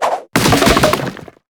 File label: multi staf; hero